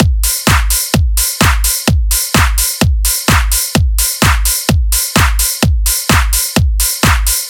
Drum Loop Full 128BPM, modern bass house

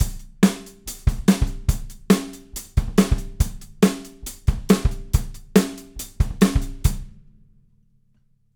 Standard Loop, acoustic studio drums

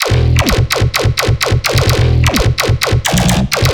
Bass Loops 128BPM E, modern bass house

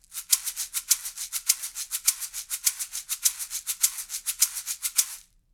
Shaker Loop; acoustic studio drums

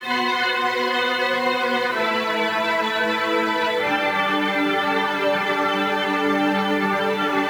Melody Loop 128BPM E Min, modern bass house

